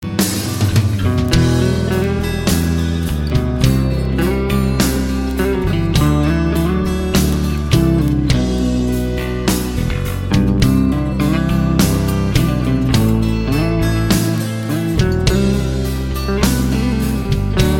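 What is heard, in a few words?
beautiful guitar; ringtones; rock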